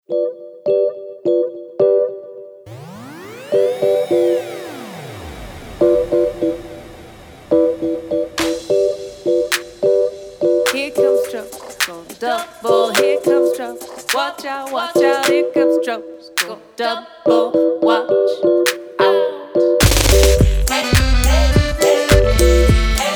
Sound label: Trouble no accordion